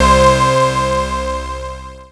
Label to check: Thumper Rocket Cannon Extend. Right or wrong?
Wrong